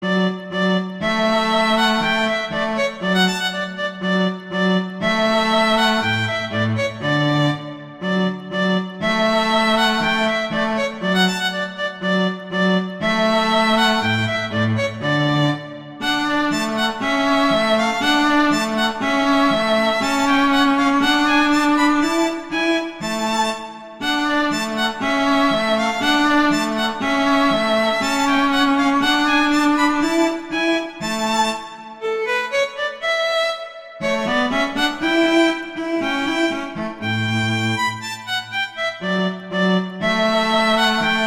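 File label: Duet, horn; Mozart; Duets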